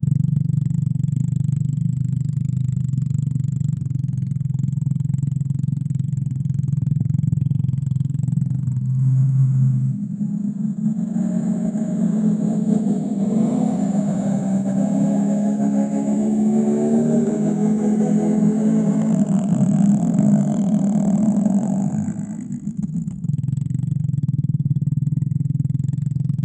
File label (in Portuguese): uma engrenagem suave com, industrial